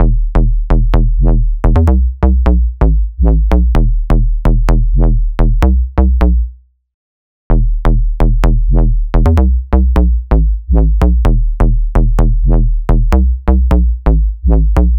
128 BPM E Min Bass; House; Drop Loops; Loop Stems; Cymatics; Loud

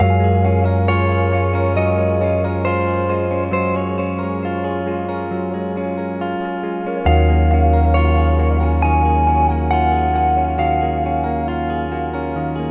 68 BPM C# Maj, Guitar Loops; Unique Perspectives; Cymatics; Journey Guitar Loops

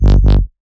Misc Bass G

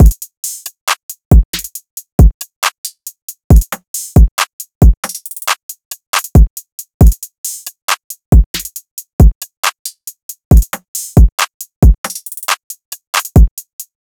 Drum Loop - 137 BPM; Loop Stems; Cymatics; Mystery Pack Vol 4; Cage Fight

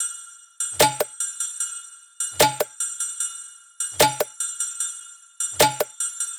Percussion Loop - 150 BPM; Drum Loops; Cymatics; Cobra Hip Hop Sample Pack

